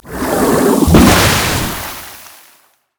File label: nashor propel; LoL; monsters